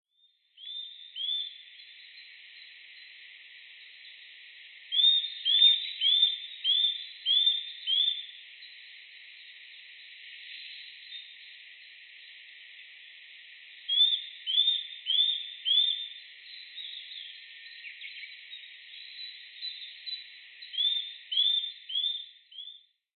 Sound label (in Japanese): gojukara, shioya, koe